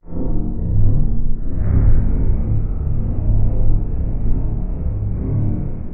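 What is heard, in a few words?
lava ambience quiet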